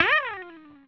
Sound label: EGTR FX; Sampleheads; Whole Lotta Country 1 & 2